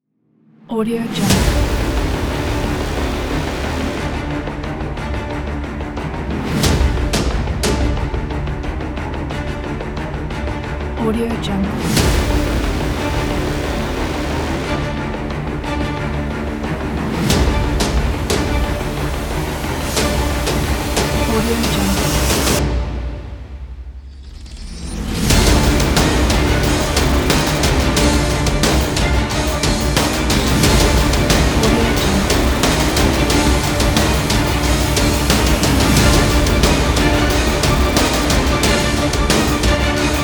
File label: action teaser